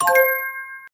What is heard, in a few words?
hint answer effect